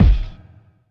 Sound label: DM5 Kick, Alesis DM5 drum machine